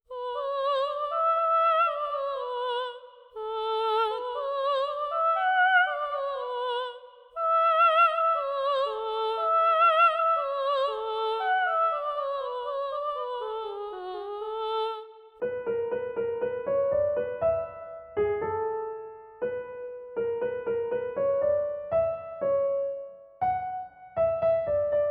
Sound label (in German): Minnelied; II Versione